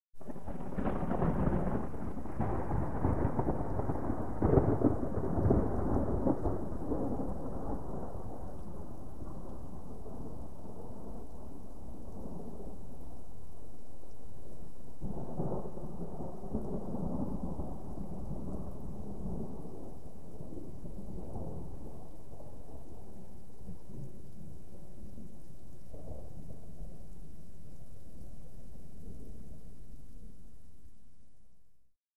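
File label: Thunder Crackling, distant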